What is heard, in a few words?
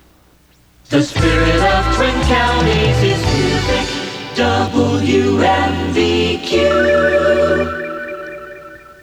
radio jingle; wmvq